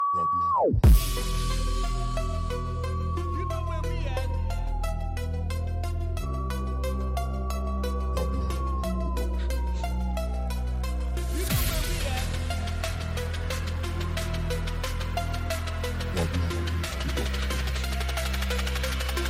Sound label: Glock Frequency